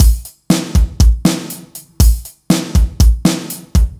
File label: Latin-like B, rock